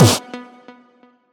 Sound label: combo power; quatuor